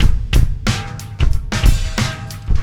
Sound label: Landing Party Beat, Processed Drumkits; Sonic Foundry (Sony Creative Software); Processed Drumkits Zero Gravity Beats